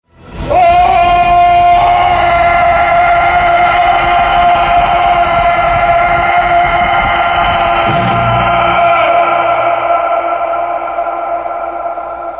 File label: Screaming; Arnold